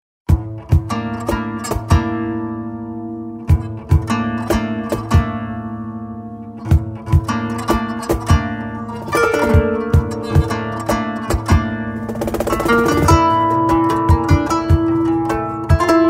Instrumental Santour

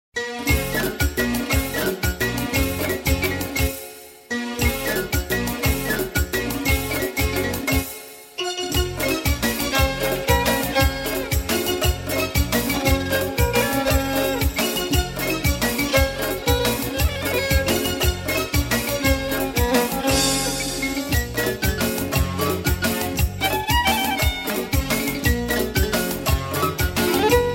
Instrumental

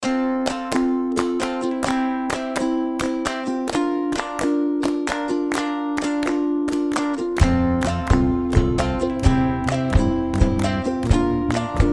Instrumental Happy Musics

